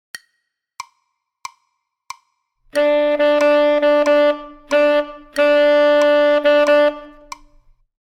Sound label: Tenor Class Example B, Saxophone; Example 21b; Master Class #21 -- Playing By Ear; Recordings For Beginning Jazz Saxophone Studies for Tenor, Volume 2